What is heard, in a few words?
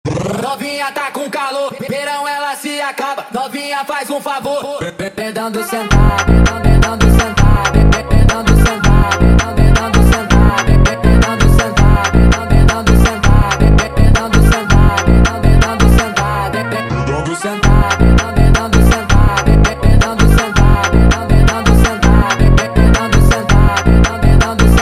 sped Up, funk